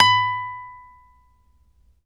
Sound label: ACC FG B, Acoustic Guitar; East West; Steve Stevens Guitar Samples Collection